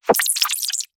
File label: jrpg game slime bumping, attack